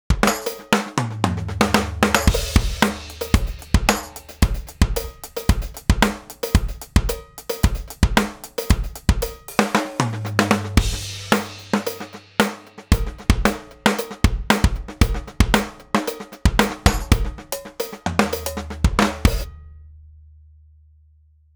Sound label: cowbell